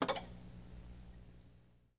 screen on; teardown; ps5 windows xbox series xs

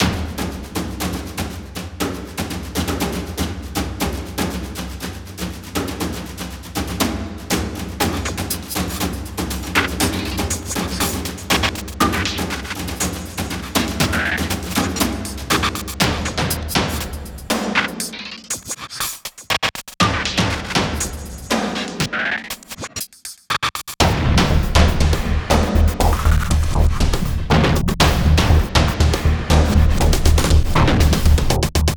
battle boss; Starcrawlers

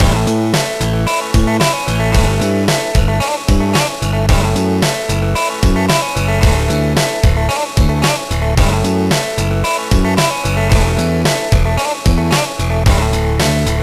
Unlocked; herbie fully loaded; gba

